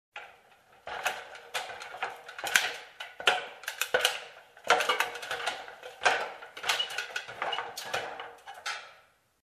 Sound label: Clattering sound in workshop